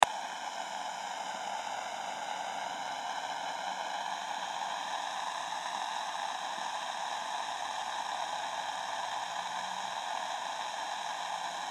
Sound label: Radio Small Static On Off; Technology; Miscellaneous Electronic; Blastwave